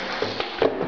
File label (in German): Auto Kiste groß